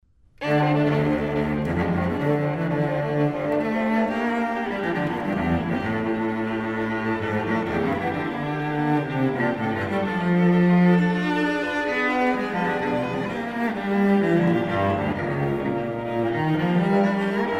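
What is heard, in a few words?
Phantasy quartet; Spain-Dunk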